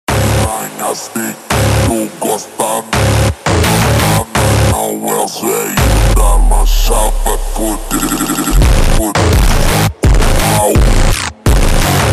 super Slowed, funk

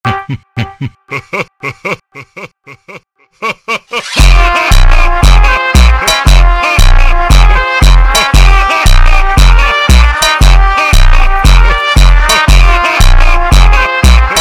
Team A Funk

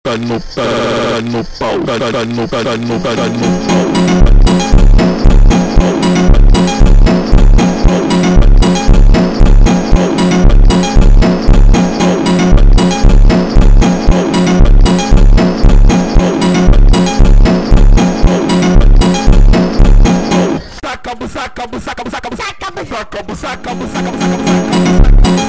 Baixo sped Up, funk

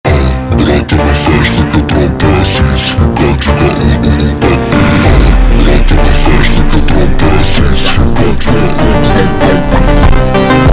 Funk slowed, funk

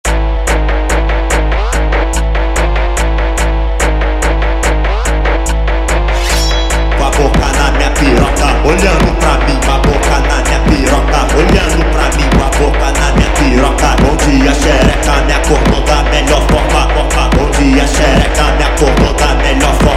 Samurai Funk speed Up

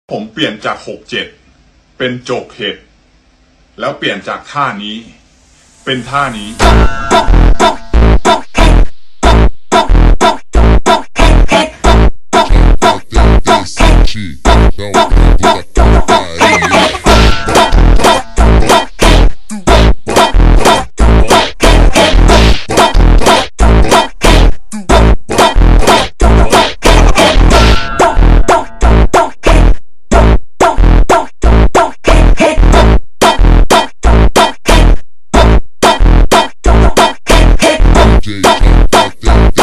Funk ultra Slowed

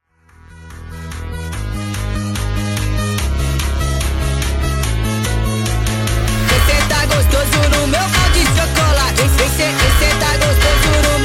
nightcore; آهنگ فانک

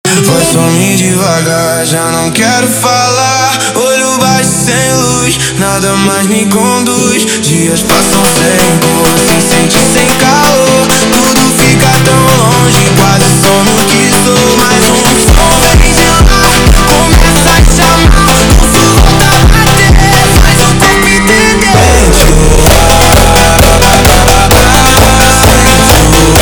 funk

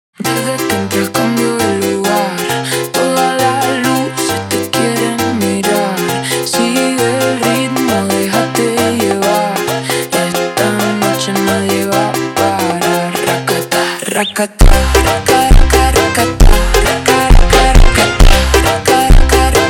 slowed, funk

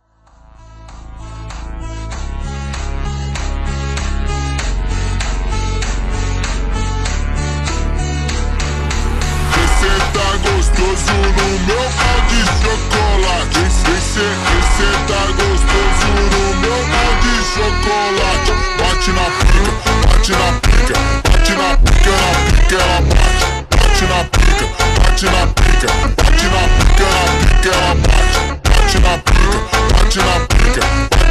ultra Slowed; آهنگ فانک

